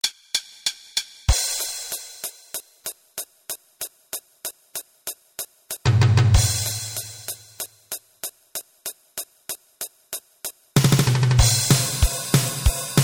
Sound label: drum